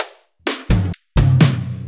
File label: Fill Ins 128BPM; Vengeance Samples